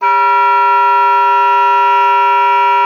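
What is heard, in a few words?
oboe d'amore; Xsample VOL-7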